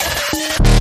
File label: Droid